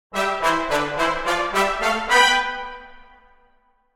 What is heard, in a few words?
stems brass; hot lava; ios macos windows